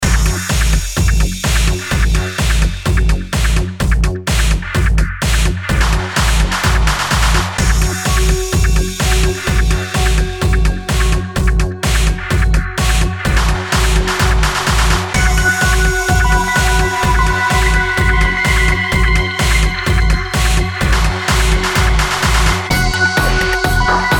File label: industrial techno